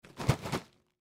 Popcorn Bag Shake, Popcorn Bag Shake Small Double Food; Blastwave